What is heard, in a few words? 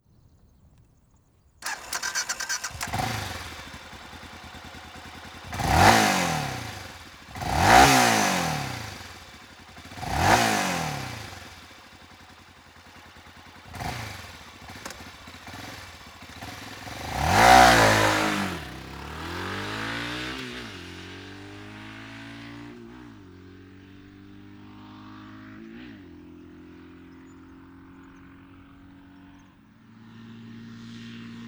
BMW F 650 Funduro, MC Lyde